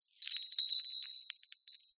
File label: household wind chimes metal pick up; zapsplat